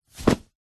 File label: clothes sports shorts drop carpet; zapsplat